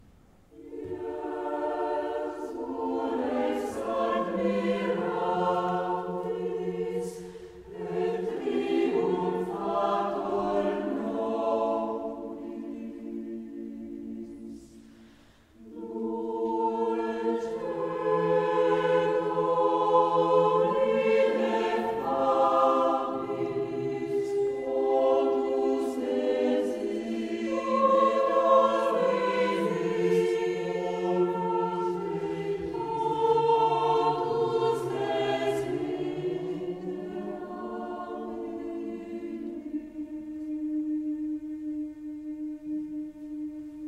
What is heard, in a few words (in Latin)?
Jesu Rex admirabilis version SAB